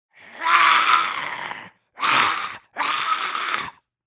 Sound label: Zombie Aggressive Attack; زامبی